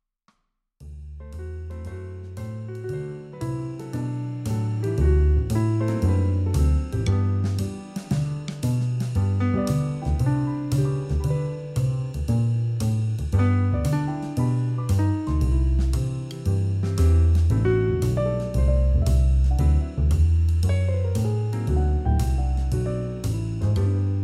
Jingle Bells Christmas Jazz

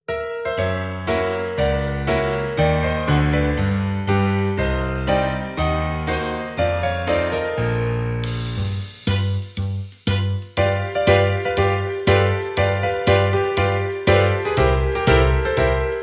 Free Jazz songs music

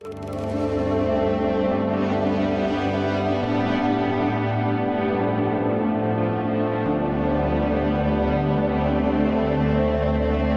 Creepy Music

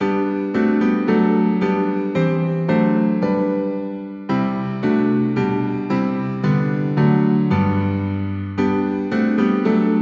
Christmas songs music; As With Gladness Men Of Old

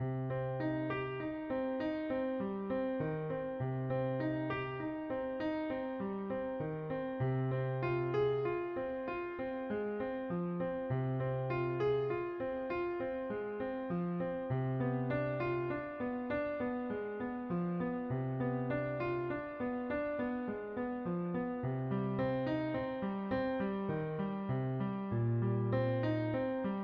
Little Prelude In C Minor, CLASSICAL MUSIC; Jean Sebastien Bach; BWV999

